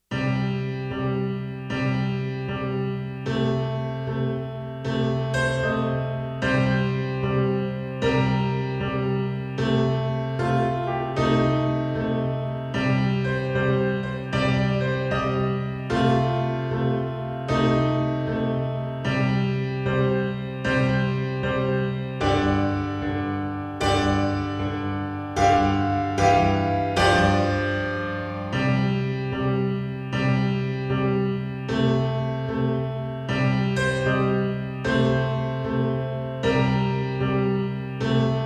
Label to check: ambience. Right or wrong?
Wrong